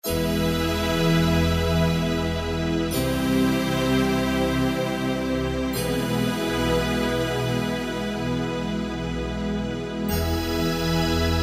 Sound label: Pressure Keys, Kurzweil K2000; Kurzweil K2000 V3